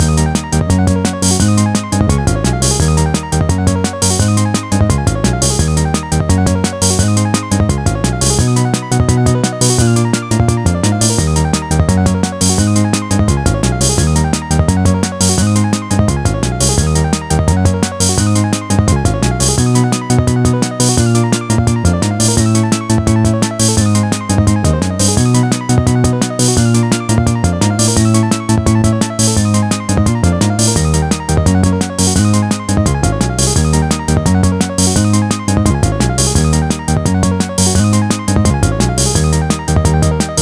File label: Bonus Game Tune; The Simpsons Hit & Run; PS2